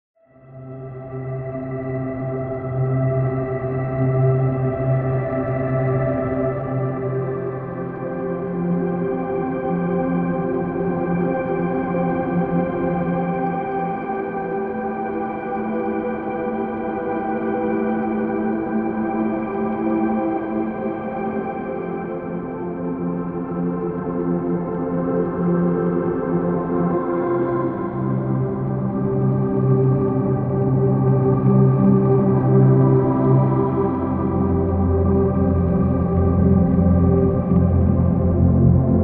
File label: Mystic Pad